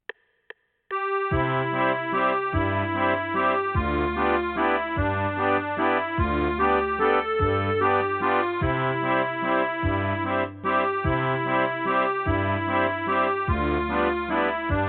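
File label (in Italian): Valzer per un'ottava, Fisarmonica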